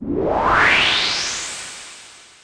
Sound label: swoosh; Stunt GP